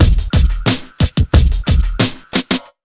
90 BEAT, Pure Electro; USB Soundscan vol.03; AKAI

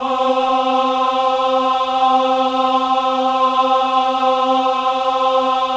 VIB C4, VIBRATO, Voices; Spectrasonics

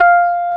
GUI HRMFLA, Acoustic, Roland

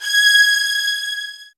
G, soft violins

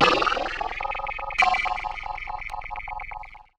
Ring Mod, Cymbal, contact mic; Murf & Ring Mod

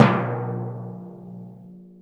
TIMPANI FFF; InVision Interactive; Lightware VOL-1

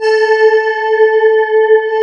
CMI ORGAN; Wizoo - Powered DX

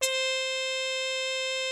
MUTED C4, TRUMPETS; Greytsounds VOL-1

